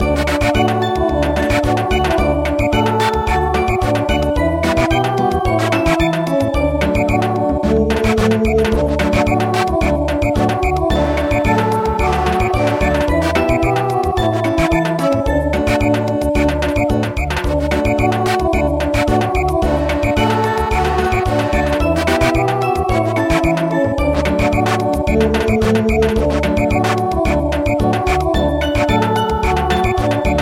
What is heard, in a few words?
Samba; Village dance gangar